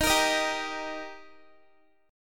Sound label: Eb Major Eb down Guitar 4E,4A,5D,5G,5C,6F; strummed